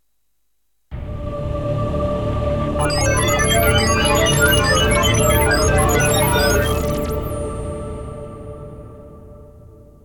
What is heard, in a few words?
startup; OpenMoko